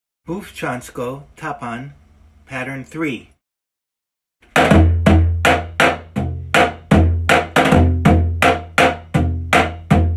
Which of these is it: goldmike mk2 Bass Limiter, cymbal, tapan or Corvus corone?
tapan